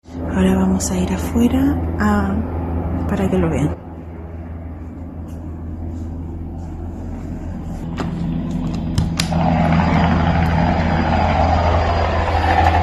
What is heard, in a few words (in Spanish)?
RUIDOS MOLESTOS; ruido de helices para control de heladas